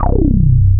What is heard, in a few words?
frog; E-MU Producer Series Vol. 8 – Platinum Phatt; Best of Phatt